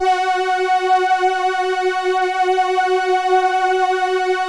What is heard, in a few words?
LAMBDA, String Machines